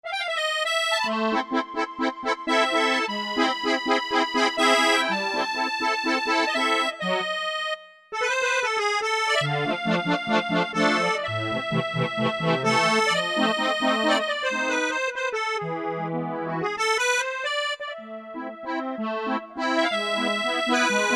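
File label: accordion, Yamaha TG500; Preset Voice; KY Acrdn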